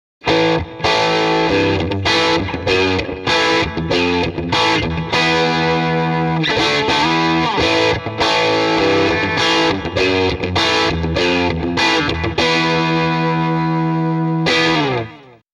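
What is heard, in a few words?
m75 tele bridge pickup jtm45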